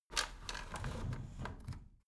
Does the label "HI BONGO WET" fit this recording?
No